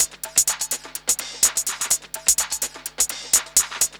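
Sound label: drum loop; X-Static Goldmine 3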